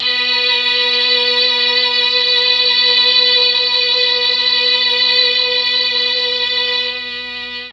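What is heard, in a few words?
B2 strings brass, Mellotron; Mike Pinder - Mellotron Archive CD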